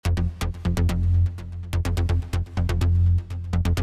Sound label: Bass Melodic Techno Hive